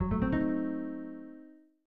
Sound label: Surprise; Koi ha Balance Battle of Lovers; SNES